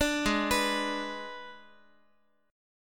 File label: Ab Diminished, Guitar; arpeggiated; 17,16,14,x,x,x; 4A,4E,5A,5D,5Gsharp,6Csharp